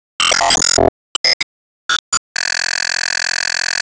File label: Ingame Result Rank 4th; disney star smash android ios mobile gamerip 2020